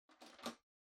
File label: Printer Far Scan Lid Open